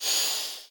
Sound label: bubble out; astro Legends Lyra and Erion Desktop; Foxium